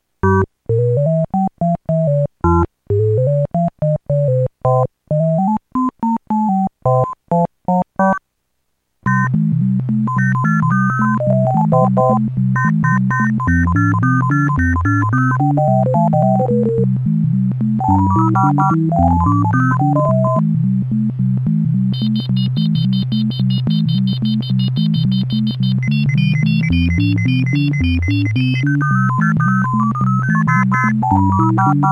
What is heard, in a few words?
tune; 2tone